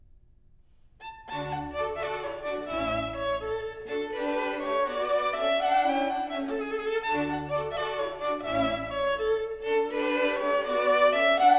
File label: viola concerto in D major; hoffmeister